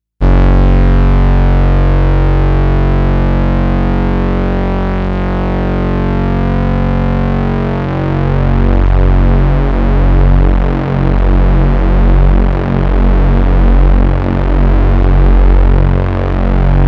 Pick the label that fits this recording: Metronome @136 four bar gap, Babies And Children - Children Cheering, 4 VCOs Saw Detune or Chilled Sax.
4 VCOs Saw Detune